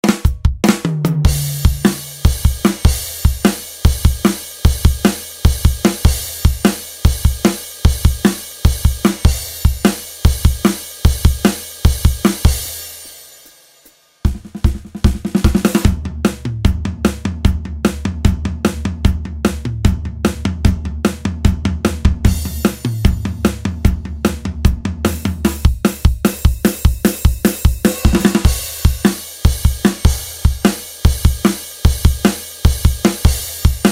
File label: rock drums only